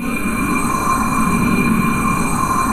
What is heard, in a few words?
BELLOWS; Pro-Rec - Synthsonic